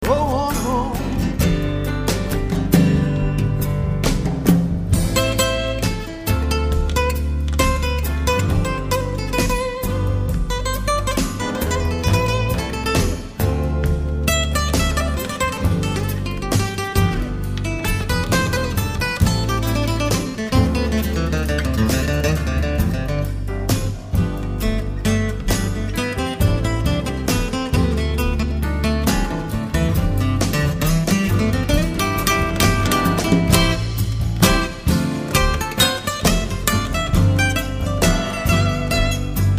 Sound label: solo